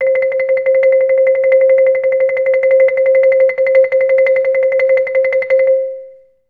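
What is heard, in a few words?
TREMOLO C3, Orchestra; Best Service; Peter Siedlaczek's Advanced Orchestra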